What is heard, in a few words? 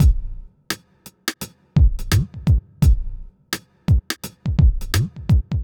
lazydays 85